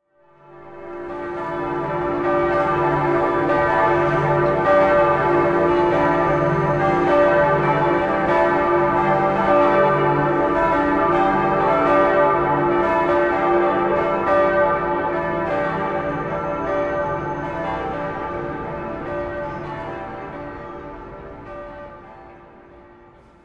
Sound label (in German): Glocken Dresdener Frauenkirche